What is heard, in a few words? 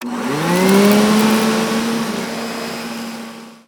Vacuum; Char Delete